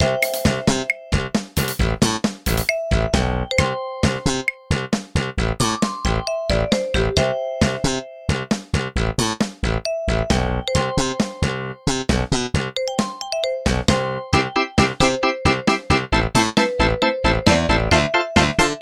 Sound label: Lunge Fish (Sea Stage) MIDI; Tetris Attack